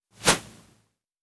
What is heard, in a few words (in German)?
zisch; Cartoon - film effects